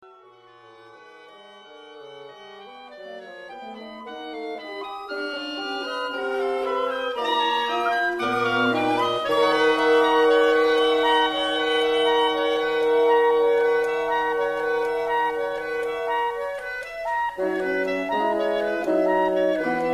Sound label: Woodwind Quintet